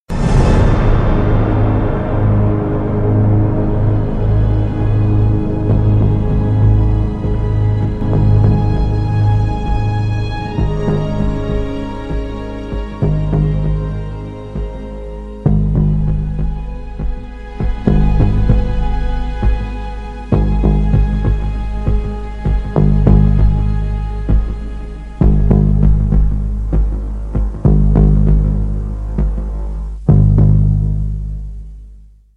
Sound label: human victory; morbus